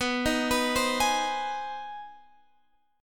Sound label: C Minor Major 7th Sharp 5th B, chord